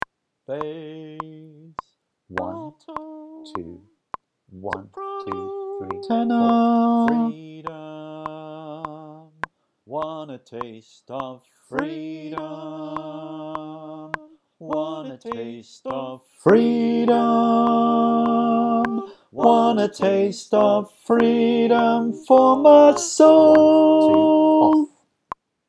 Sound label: Tenor w click